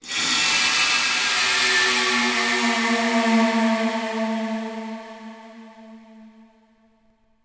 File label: ice; DAoC